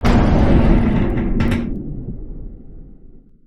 structure blunt; Barotrauma; Subsurface; Damage

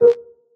Chat Msg; Enhanced Immersion (NO ITEMS OVERRIDE)

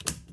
switch; Barotrauma; EK modern

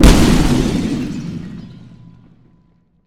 glass break; Barotrauma; Subsurface

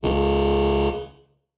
Alarm Heavy Electrical; Alarmssirens; EK modern

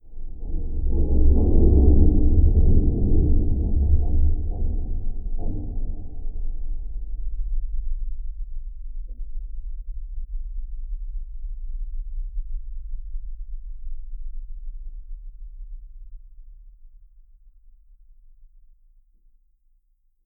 creak; Barotrauma; Subsurface

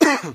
male cough; Barotrauma; Neurotrauma

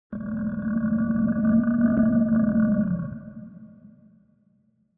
Mud Dragon death; Barotraumatic

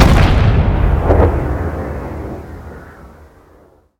railgun heavy; Weapons; EK modern; Barotrauma

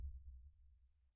sonar Tail Air Close Beacon Directional; Barotrauma; Real Sonar

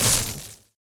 shield hit; Barotraumatic

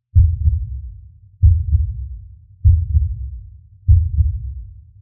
Abyss Heartbeat; Barotrauma; Barotraumatic; Voidstalker

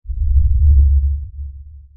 sonar Ping Air Medium Beacon Directional; Barotrauma; Real Sonar

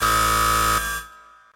Alarm; Barotrauma; TSFX

